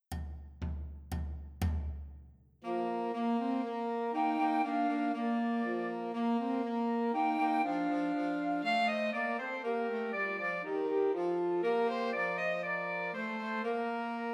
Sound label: The Holly and the Ivy, Christmas Trios for Flute Alto Sax and Tenor Sax